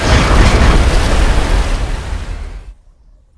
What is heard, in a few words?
turret jam cleared; Quake 4 Alpha; weapons turret